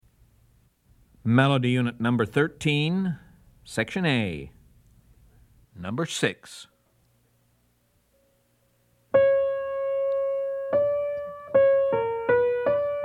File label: melody, ear training recordings